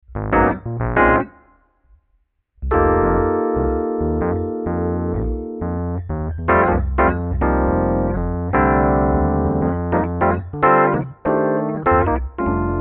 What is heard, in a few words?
dry midrange, fender rhodes